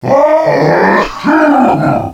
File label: achoo; DS; ML BOWSERS INSIDE